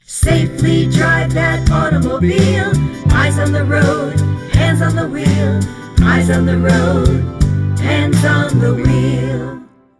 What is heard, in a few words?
Jingle